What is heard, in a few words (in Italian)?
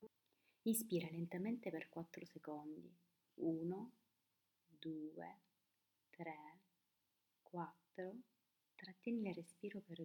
respiro calmo